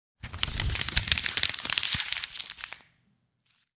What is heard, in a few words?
crumpled paper; مچاله شدن کاغذ